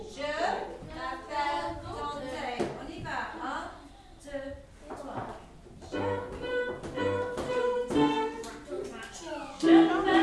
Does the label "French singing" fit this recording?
Yes